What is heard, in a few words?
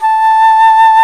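Alto Flute vibrato; Roland L-CDX-03 Disk 1; A.Flt vib 2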